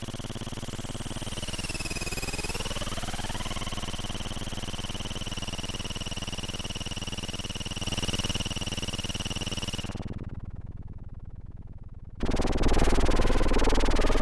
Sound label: dissonant noise